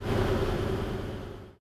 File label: engines; World of Tanks